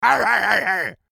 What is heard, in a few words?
Character Pirate Support; Angry Birds Epic